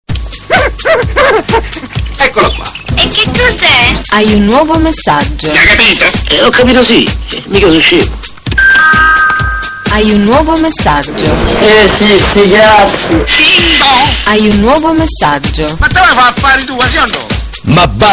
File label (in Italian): Messaggio